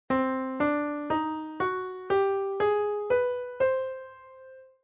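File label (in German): Tonleiter C Zigeunermoll; Weitere Skalen; Tonarten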